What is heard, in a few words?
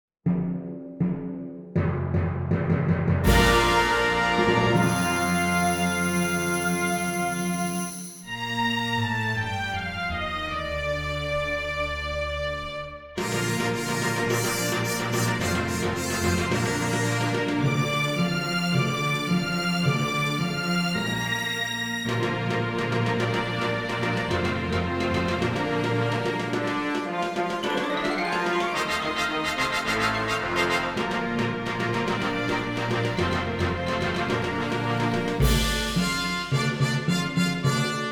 Jamaican Folk Song